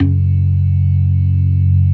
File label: CLASSIC C3, Synth and Organ